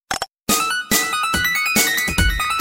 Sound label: beat; garrysmod